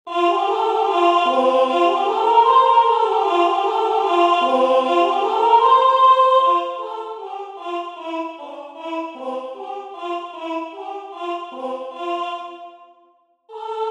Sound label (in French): LA MERE ANTOINE alti; Association des Amis de Rabelais et de La Devinière